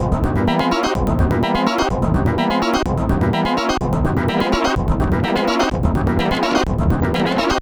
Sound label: Lead